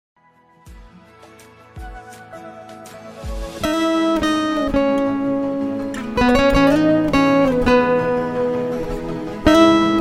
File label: instrumental cover; msdhoni